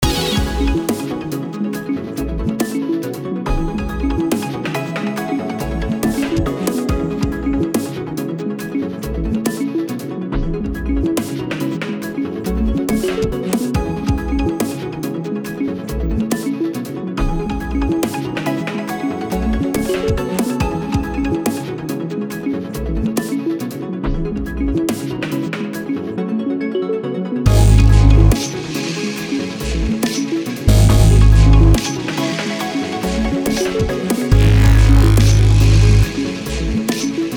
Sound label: Dubstep Sub-Mix